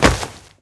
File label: Step Grass Layer